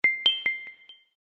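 original ringtones and notifications Samsung Galaxy S22 / S22+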